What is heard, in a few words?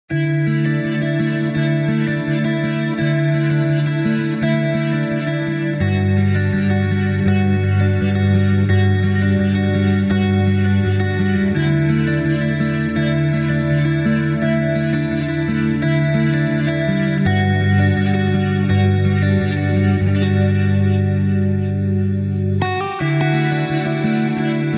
Sleep And Snore, Folk